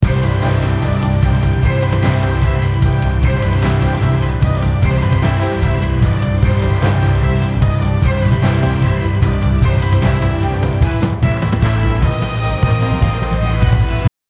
police crime live intro

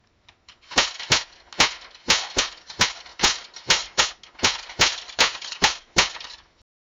many books on metal chain, clanging

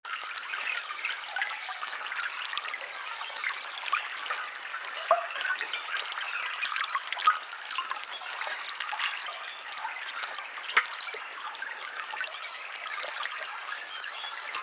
create sounds of water fl

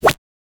sucking swoosh sound

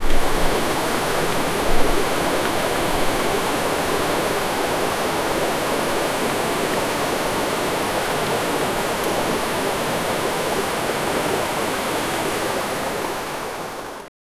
something big falls into, big impact

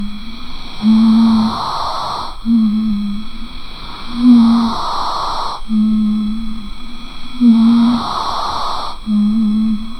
recreation auditorium a 1, sleep

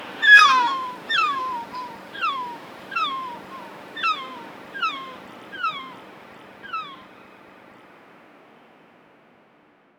delicate and elegant sound, air displacement

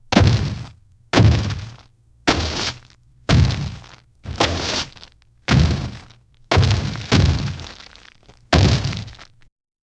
massive bull footsteps on, stomps

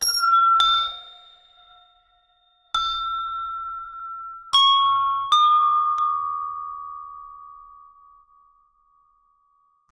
halloween chime

